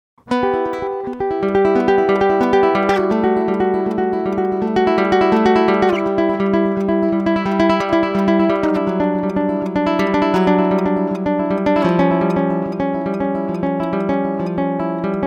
Archtop Tenor Guitar pickup

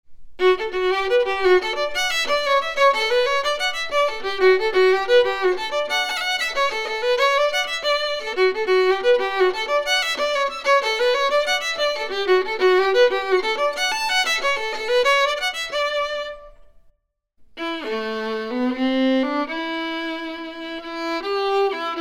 5 string F4 w ambience

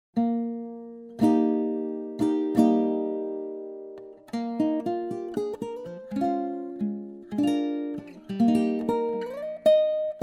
Koa Baritone Ukulele

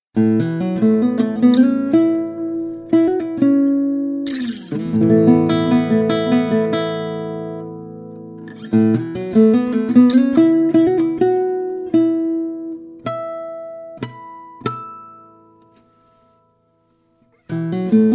Maple Dream Guitar

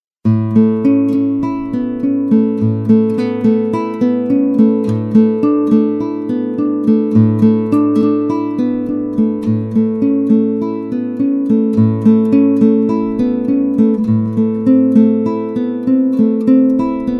Ziricote 5 String Dream Guitar

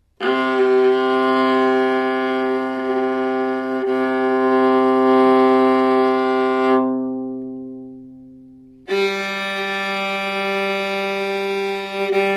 viola open strings

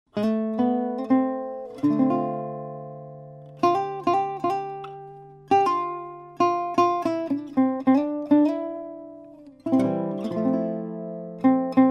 Maple Archtop Ukulele

